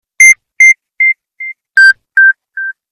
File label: Drops